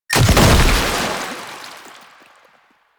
Lancer Death Explosion; Enemies; Soldiers; Warframe; Lasrian

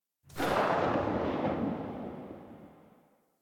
Elite Shotgun Tail; Warframe; Weapons

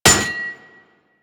Melee Axe Impact Generic; Warframe; Weapons; Axes

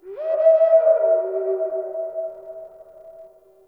Night Creature Distant; Ambience; Night One Offs

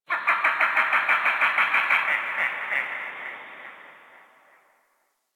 Distant Zone One Creature; Warframe; Ambience; Eidolon Plains; One Offs

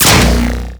Bomb Puzzle Switch On; Missions; Raid; Grn Bomb Objective

